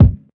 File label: kick, classic hip hop